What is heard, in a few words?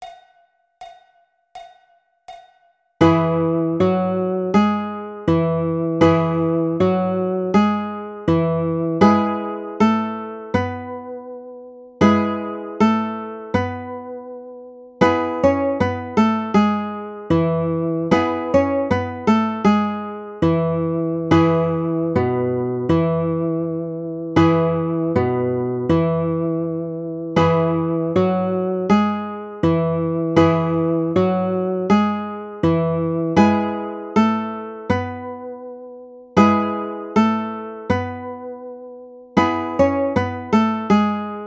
Brother John Guitar